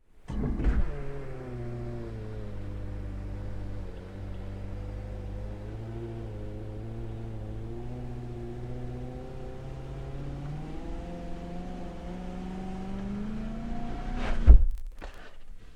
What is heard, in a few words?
Car Electric Windows - Up and down - various different cars; Vehicles